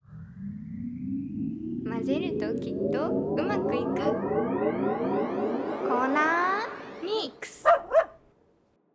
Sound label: MIX soundlogo